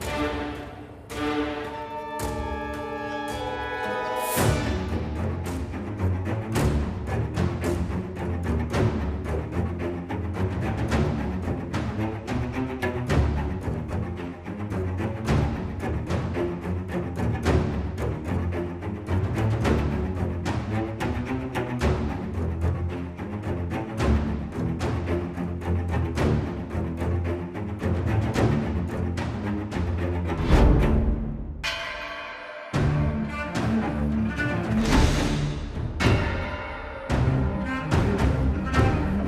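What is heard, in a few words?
music fight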